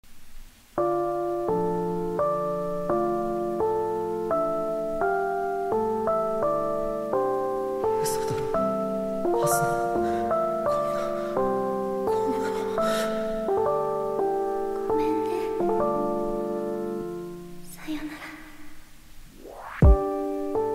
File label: lofi hiphop; Sword Art Online but it's lofi hiphop